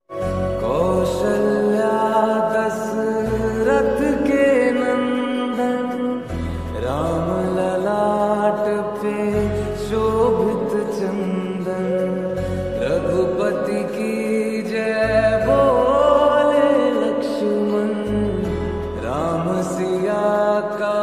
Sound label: ringtone slowed and reverb